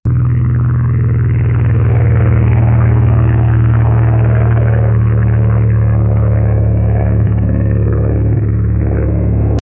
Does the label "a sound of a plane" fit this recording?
Yes